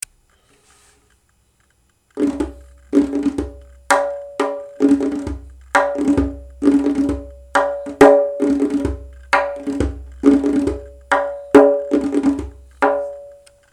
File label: 2 4 beat, Tom Bak